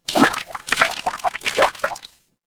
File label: water swirl